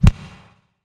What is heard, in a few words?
anime punch